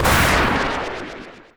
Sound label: Enemy Ghost Expose